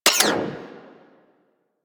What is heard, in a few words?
Mech, Weapons Rifle; Drake; Tiberon Prime